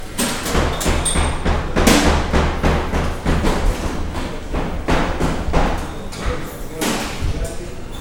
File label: Pulling Down A Wall